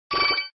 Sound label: fire gold